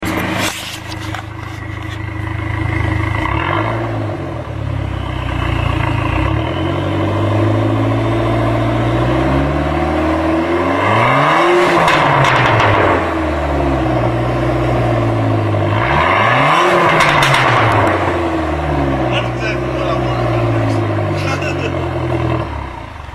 Neon SRT-4 3 inch no muffler, custom exhaust systems